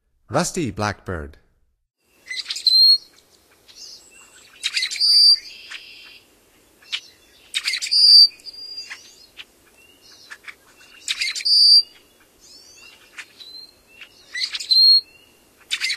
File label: Rusty Blackbird, Songs of Birds of North America; Stokes